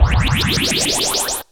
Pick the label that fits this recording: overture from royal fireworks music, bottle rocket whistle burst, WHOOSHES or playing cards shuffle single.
WHOOSHES